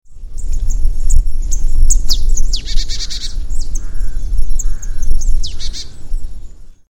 weidenmeise

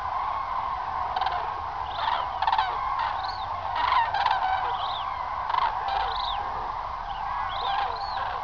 kranich

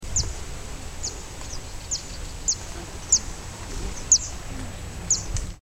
cistensaenger, vogelstimmen

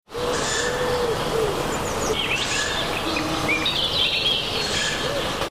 waldkauz junges

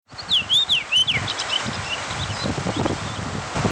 rotdrossel

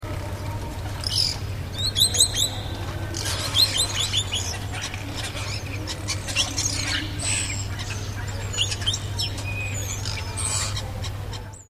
einfarbstar

